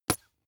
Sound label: bath duck hit